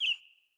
Bird Single Chirp; Josalfar Bird Guide

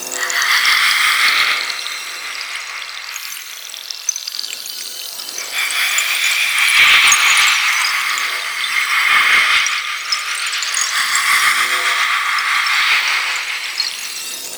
Cursed Medallion Loop; Shadowgrapher

